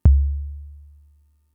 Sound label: Kick, Drum Machines Fricke MFB-522